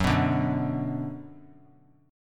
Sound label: F sharp Suspended 4th Sharp 5th chord; strummed; F#sus4#5